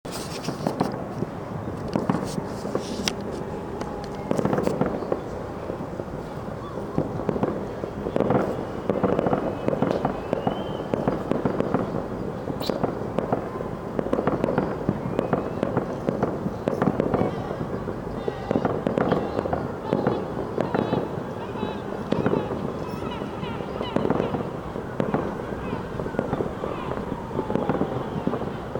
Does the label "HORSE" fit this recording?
No